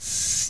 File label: Hell Spider